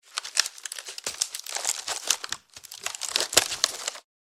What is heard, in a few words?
Firewood kindling movement; wooden